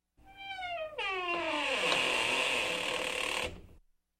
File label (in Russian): zakryvaetsya skripuchaya dver